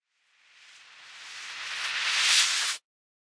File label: Reverse Spell; SoundsCrate